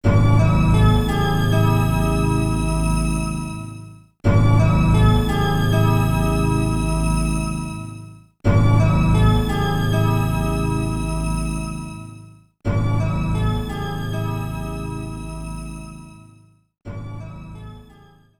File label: Twinkle; 3do; Total Eclipse